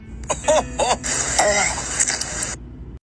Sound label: ohoho eugh